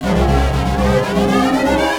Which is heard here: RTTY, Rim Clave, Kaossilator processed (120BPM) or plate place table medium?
Kaossilator processed (120BPM)